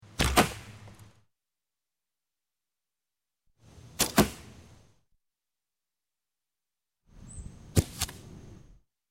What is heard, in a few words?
Archery Arrow Shot; Sound Ideas 2000 Series